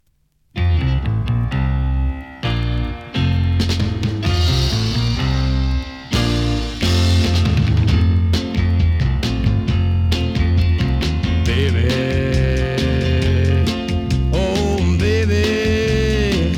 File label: drummond break